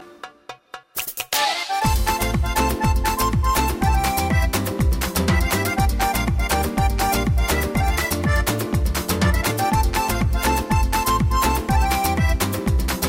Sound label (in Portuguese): rumba portuguesa